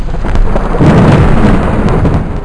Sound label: STORM